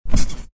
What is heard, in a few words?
Bed jumping